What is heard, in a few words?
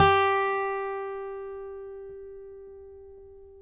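acoustic guitar pick G4; Country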